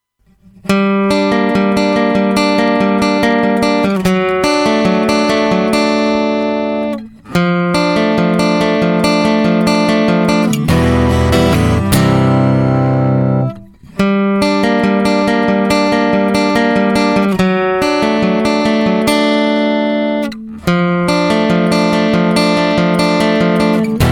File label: Acoustic Guitar Saturation; Black Lion